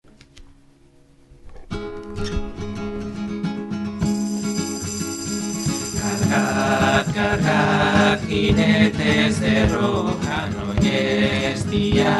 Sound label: instrumental; cargarohirrim